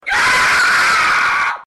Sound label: scream